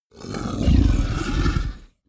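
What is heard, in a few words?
fishman breath grunt, creatures; kreaturen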